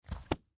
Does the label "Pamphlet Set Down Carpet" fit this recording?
Yes